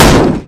vehicle hit person

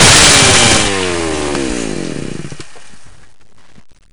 saw kill; slender; cof

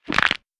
crack neck; slender

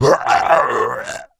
die; slender; zombiebm

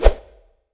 swing; slender; cof

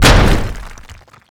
garg hit; slender

